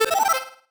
marimba dice; ゆめ2っき

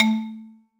marimba; ゆめ2っき

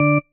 marimba tissue; ゆめ2っき

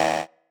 marimba bike; ゆめ2っき

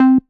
marimba; ゆめ2っき

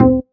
marimba; ゆめ2っき

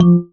marimba raincoat; ゆめ2っき

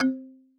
marimba kalimba; ゆめ2っき

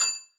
marimba

